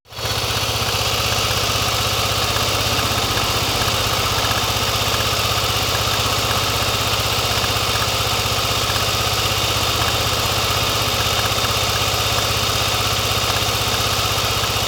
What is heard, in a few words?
bmw